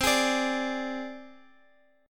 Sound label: C Suspended 2nd Sharp 5th, down, Guitar, Open G